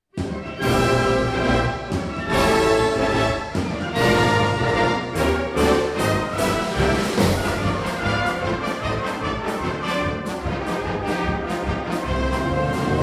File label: cartoon